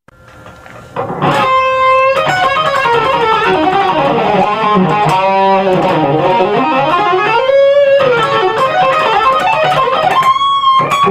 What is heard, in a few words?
shred; guitar-teacher